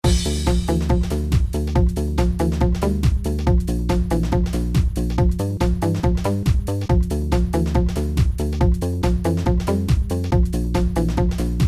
Instrumental

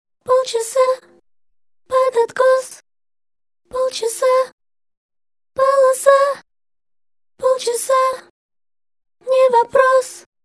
Backs

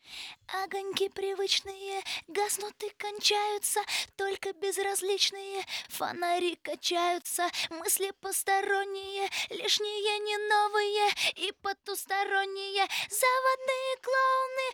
acapellas

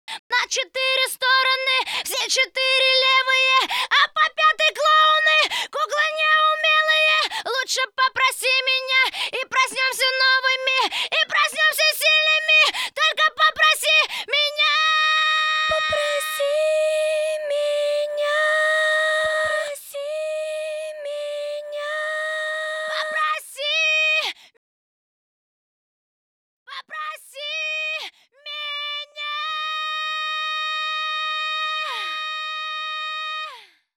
pripev lead

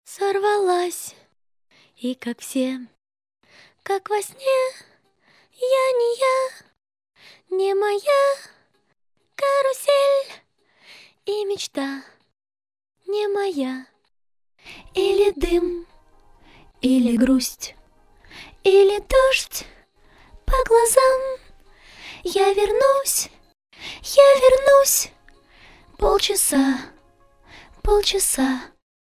verse, acapellas

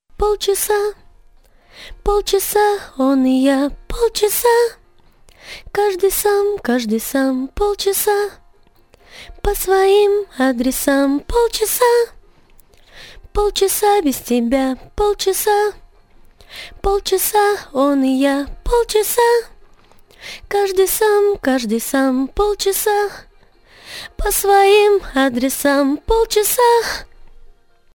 chorus, acapellas

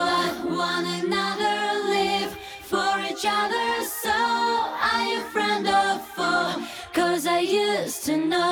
vocal loop, acapellas

